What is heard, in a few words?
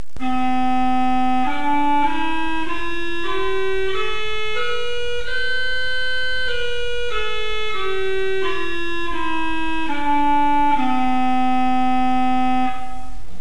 cromorne, Chorus reeds; organ